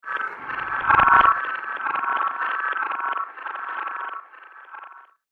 Jungle Zombie Planet Ambience, scary soundscape

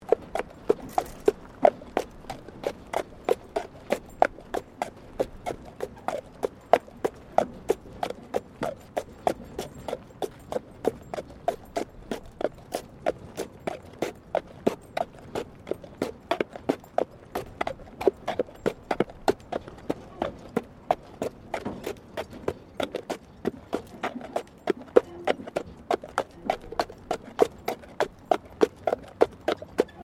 Tram Horse Drawn Sequence Horse Isolated Gravel; Horse drawn tramcar; Carriages; Vehicles

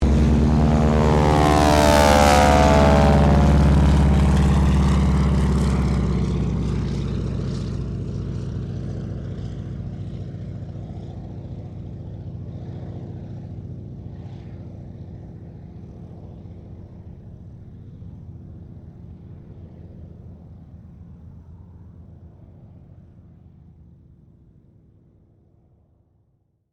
AIRCRAFT BOEING PT-17 STEARMAN TAKEOFF; PT-17 Stearman Trainer; Airplanes

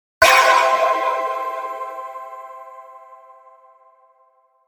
Hit Magical Shimmer Burst; Blastwave

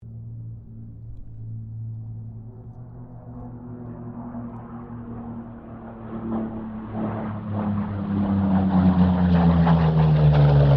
AIRCRAFT P-40 KITTYHAWK PASS STEREO; Airplanes

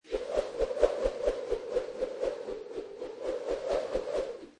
Lasso Many Rope Swishes; Rope, cable or other object swishing around repeatedly; Lynne Music

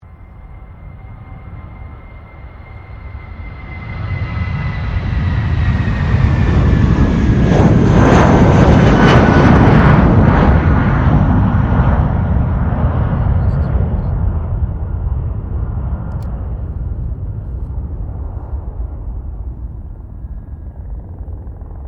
AIRCRAFT A320 AIRBUS LAND; Airplanes

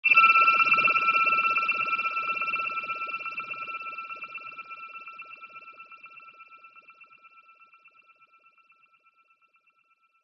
60S Sci-Fi Effect; science fiction sound effect; star wars / trek style

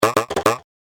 Cartoon Boing; Andrew Fleming

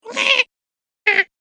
Cartoon Game Voice Baby Sounds; Cartoon Voice Sound Effects

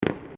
Fireworks Multiple Crackles; Backgrounds; Fireworks (Sound Effects:Backgrounds); CraftedSignals

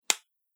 Single Hand Clap; Body Sound Effects; Human Body

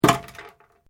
Metal Light Movement Minor

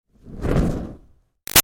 Fire Torch Whoosh Med; Flames; Sound Effects:Objects; Big Room Sound

